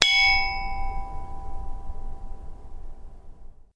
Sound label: chinese bronze bell front; sackler